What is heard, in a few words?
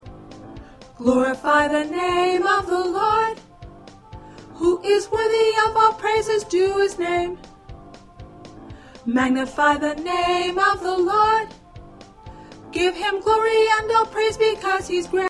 Alto